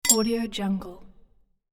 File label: interface lever metal click